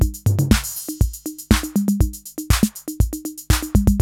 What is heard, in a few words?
CR-8000 LOOP, DRUM LOOPS